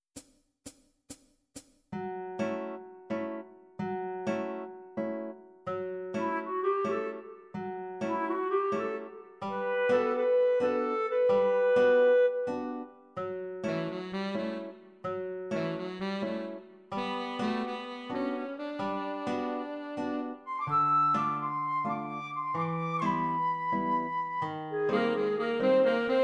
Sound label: bossa minus pno